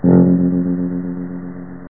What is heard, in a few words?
Buzz